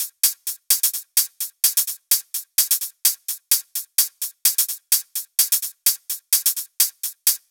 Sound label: 128BPM Kit; Vengeance Samples; Unimatrix Kit